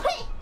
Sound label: badminton noises